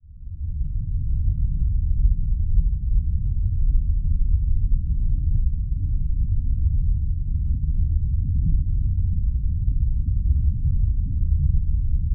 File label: Underwater Water Ambience Cave Deep Depth Rumble